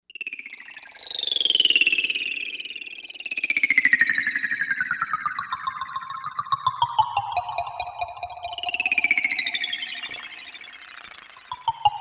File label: Clicker, Korg M1Rex; Factory Patches